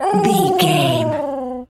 pigeon call angry

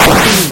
retro explosion short

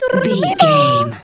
voice fun character vocal crazy

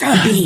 voice male hurt pain

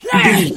voice male attack

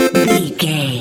collect item chime

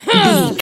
voice female attack

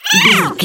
goblin fairy hurt pain

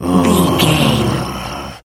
voice male growl

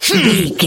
voice male attack

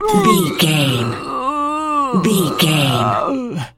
voice male death torture

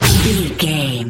sci-fi weapon blaster laser boom zap

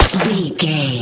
gun silenced ar rifle shot

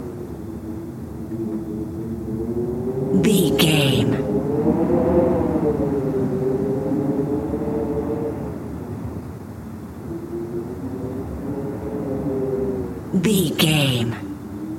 wind cold howling haunted night loop

